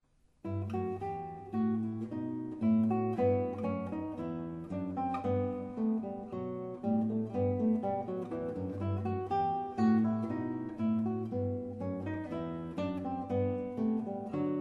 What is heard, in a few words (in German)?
adventliche gitarre